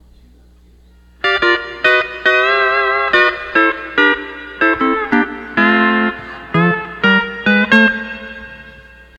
12 String Lap

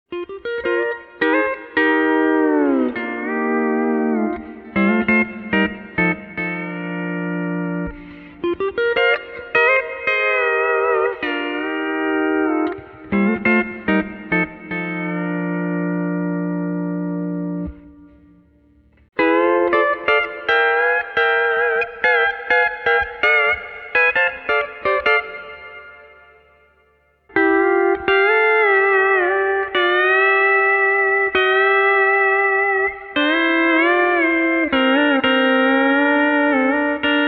Bassman Sounds

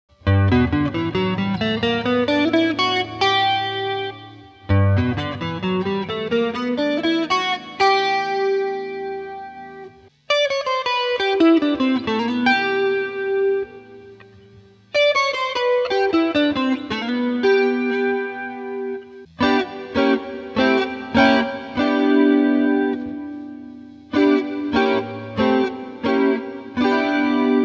Guitar Enhancer, AXE FX 3

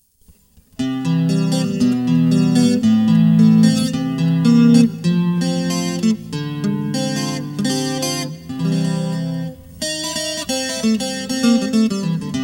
ACCOUSTIC GUITAR, Boss GT-8

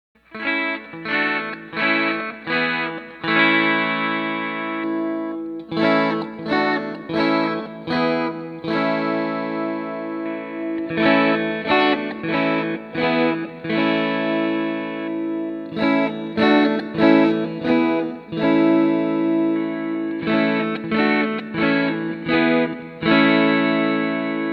Nash Electric

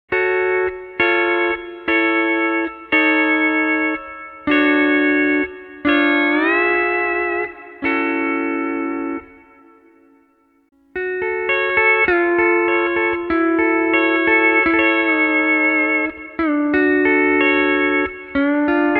Pedals Sounds; RKL, LKV, Pedals 4,5,6 and 8 Chalker Sounds

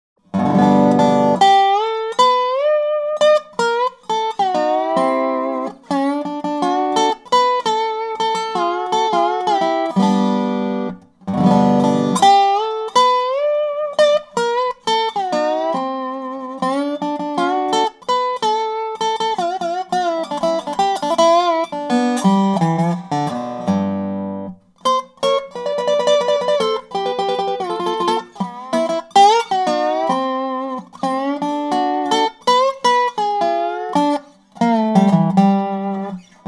8 String Dobro

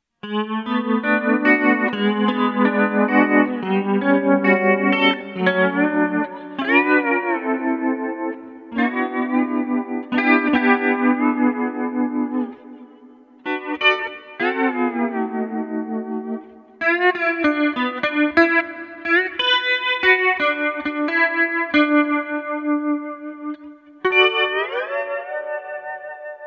Tremolo, AXE FX 3

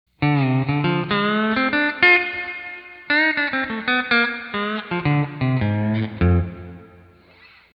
D13 Lower Strings Riff; RKR

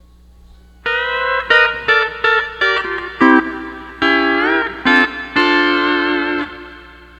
12 String Lap

